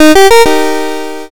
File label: win